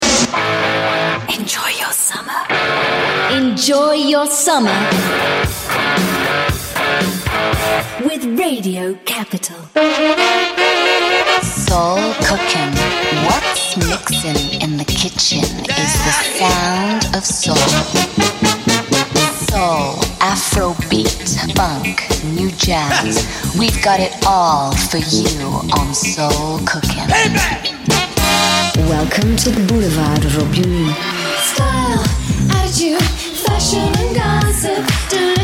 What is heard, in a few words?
Radio Singing Jingles